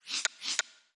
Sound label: Sfx American football